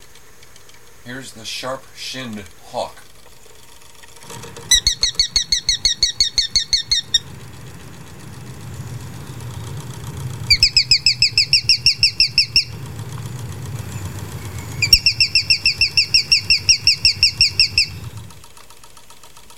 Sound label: sharp shinned hawk, birds; birds-and-frogs